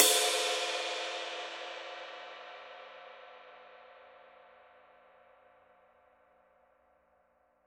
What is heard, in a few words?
PAISTE TWENTY MASTER DARK RIDE